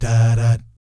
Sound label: "DAH DAT", vocal jazz fx